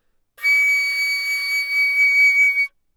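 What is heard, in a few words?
Flute vibrato ff Db7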